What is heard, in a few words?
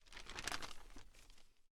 News Page Turn; The 39 Steps